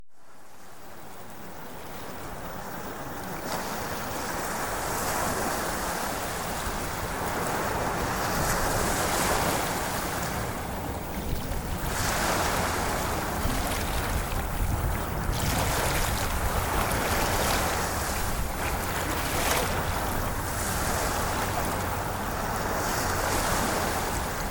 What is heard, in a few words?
Ocean Waves